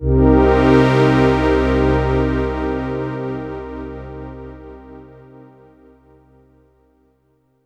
LITE PAD MIN, Synths

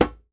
djembe; Voice Crystal - Steve Reid Definitive Percussion

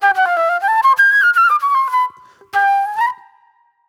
Jammy Flute

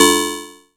BRIGHT, PERC HITS; Zero-G Ambient 1

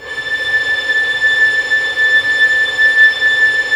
STR VLS, STRINGS; Roland - LA Composer vol. 1

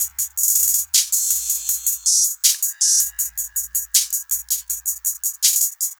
Hi-Hat Esoterica, Beats

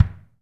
BD, DRUMKIT; Best Service; Hyper Dance

